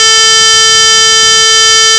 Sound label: pipe A